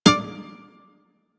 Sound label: Shawzin Wrong Note C; Warframe